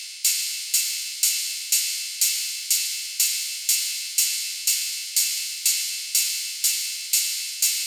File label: 122 BPM Ride; MMB Drum Arsenal Vol 1